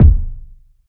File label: roomy kick sample g sharp key